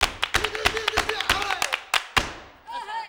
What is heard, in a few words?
VOC, Gipsy